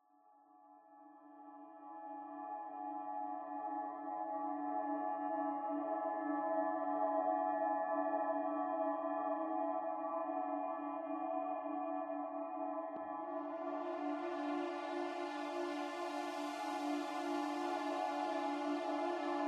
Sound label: ambient